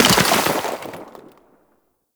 Trench Small Dirt Impact; Gameplay; Warframe; Tau

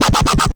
Alt Fire Growl; weapons; Warframe; Infested; Arm Cannon; Coda Bubonico

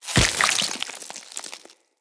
Root Spider Web Shot Proj Burst; Animals; Spiders; Abilities

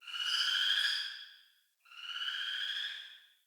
Cave Ocean Creature Close

